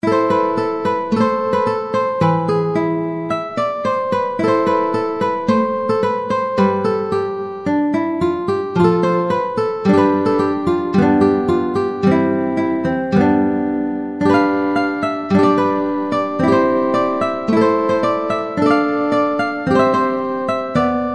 guitare solo

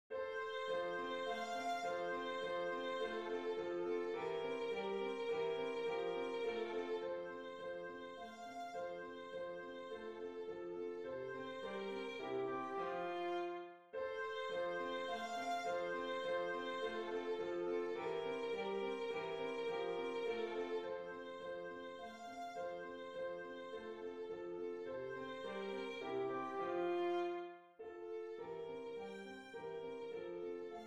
danse allemande; beethoven ludwig van

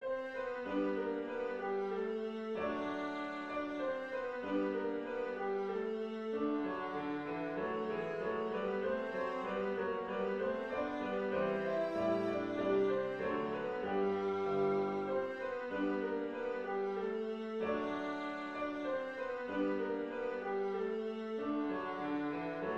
danse allemande

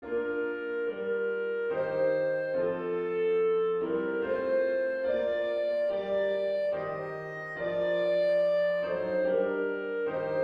hymne national anglais

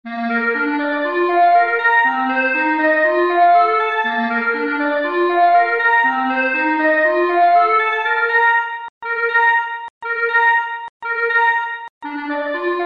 libre exercice pour clarinette

